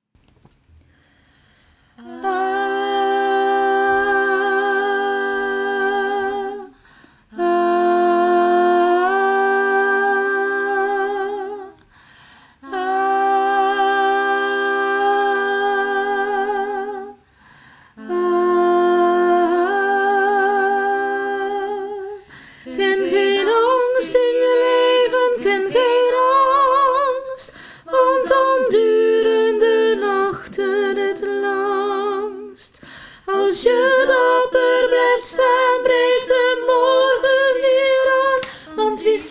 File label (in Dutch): hoge stem; peter pan